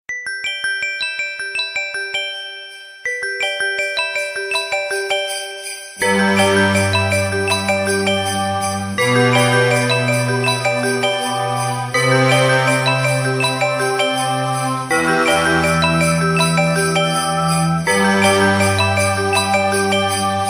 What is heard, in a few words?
iphone 12 pro max christmas